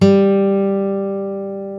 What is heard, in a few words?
GUI Ac. G, GUIT AC; Best Service Gigapack 2